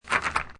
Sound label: Billiard Pool Snooker Rack Up Balls In Frame; Lynne Music